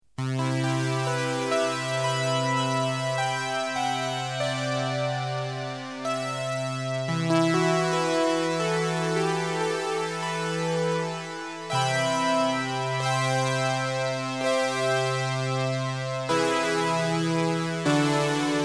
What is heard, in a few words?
jh3200 full polyphony; polykorg